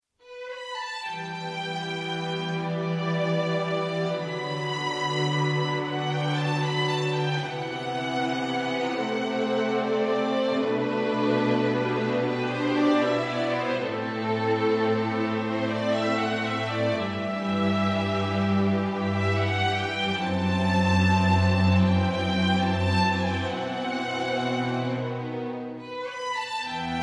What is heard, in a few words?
sorrow